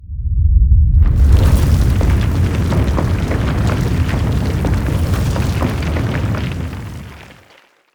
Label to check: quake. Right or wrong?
Right